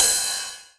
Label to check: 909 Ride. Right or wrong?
Right